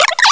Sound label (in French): Cri